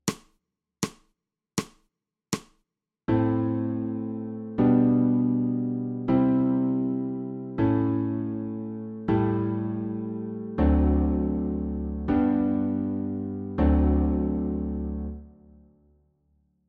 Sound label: SOPRANO